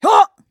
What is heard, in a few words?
flyer attack